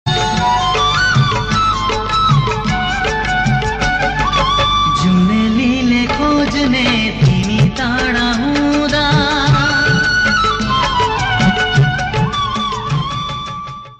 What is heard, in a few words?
nepali emotional